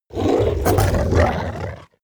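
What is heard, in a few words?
hellhound gnarl aggressive, creatures; kreaturen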